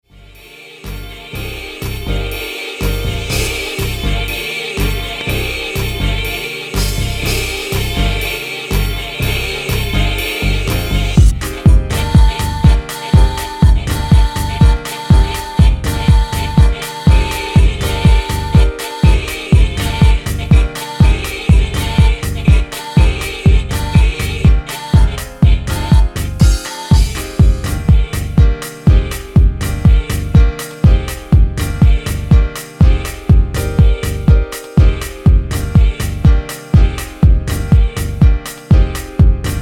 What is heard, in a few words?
trance